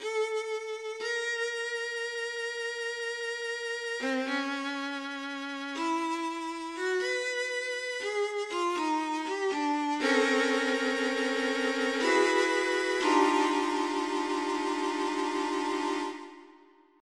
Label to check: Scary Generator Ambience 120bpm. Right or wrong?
Wrong